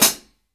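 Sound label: MIX foot hi-hat, OLD HH; AKAI S-Series CD-ROM Sound Library VOL-3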